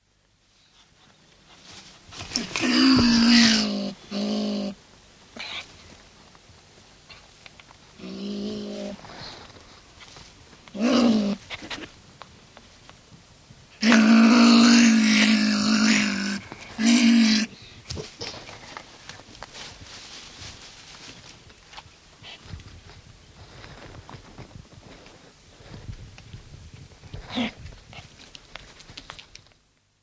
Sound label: pine marten calls